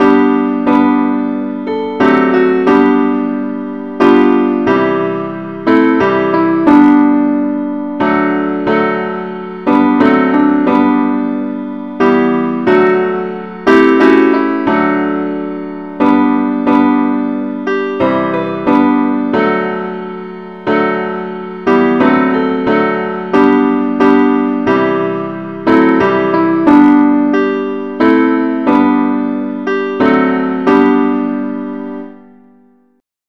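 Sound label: simple accompaniment key G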